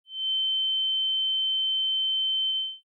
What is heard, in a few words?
Sin 14bit Repitch; 50cent